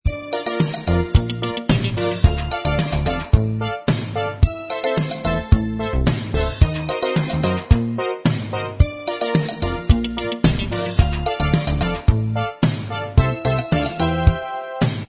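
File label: instrumental, backing track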